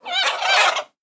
crane action

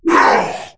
Damage

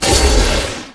Aiming Shot

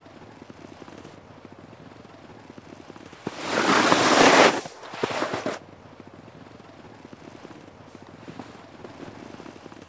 Snow Board Idle; Seer; GamigoNA

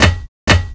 walk

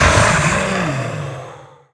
shock scream

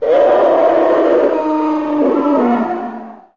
Vehimoth Die; Seer; GamigoNA

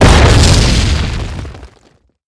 Attack

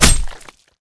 Dmg Sword Skin; Seer; GamigoNA

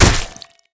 Dmg Axe Skin

